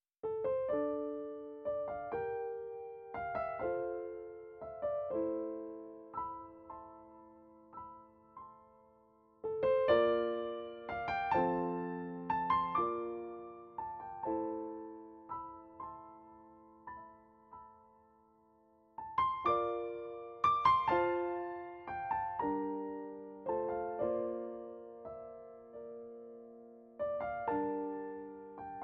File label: Piano Solo